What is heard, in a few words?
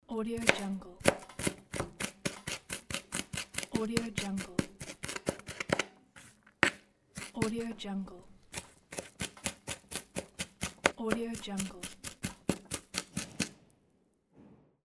chopping vegetables fast